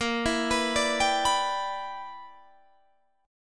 E Minor 7th Bb; Em7/Bb; arpeggiated

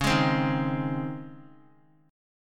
C sharp Major 7th Suspended 2nd C sharp; C#M7sus2 strummed; JGuitar

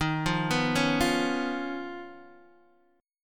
Eb 7th Suspended 2nd Sharp 5th Eb, Guitar Open D

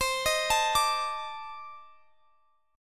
C Minor 6th Add 9th Guitar Standard; arpeggiated; JGuitar

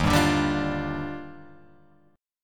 D sharp Major 9th, Guitar Open G

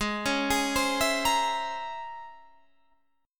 Db Minor Major 13th Ab

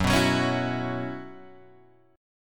F sharp 7th Suspended 4th, Guitar, Open G

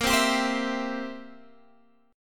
C Major, Bb, chord

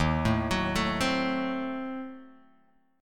Eb Diminished 7th Guitar Open F, chord; Ebdim7 arpeggiated; 3,4,6,4,3,x; JGuitar

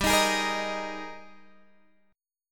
G sharp Major 7th Suspended 2nd

